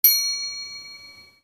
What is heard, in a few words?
ding